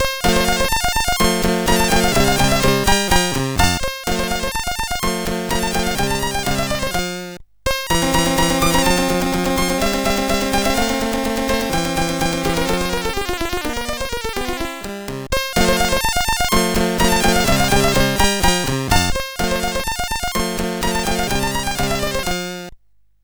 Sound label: Scherzo remix